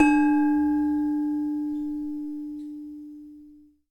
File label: Demung